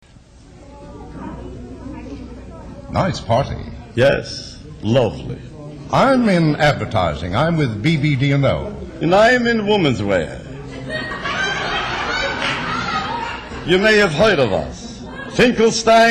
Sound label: Cocktail Party